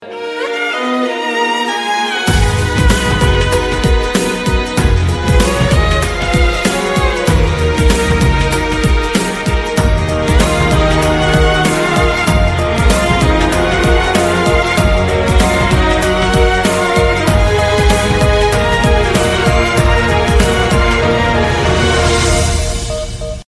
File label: beat ringtone